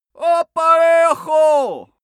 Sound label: male shout arabian